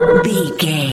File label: sci-fi alarm warning loop